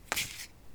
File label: card Shove, Casino; Kenney's Sound Pack